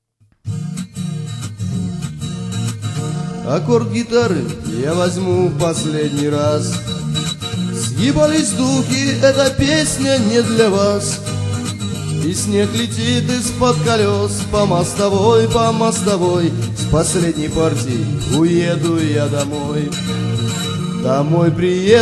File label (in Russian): Akkord gitary